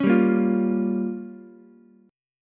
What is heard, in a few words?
Bb Minor 6th, Ukulele; strummed; JGuitar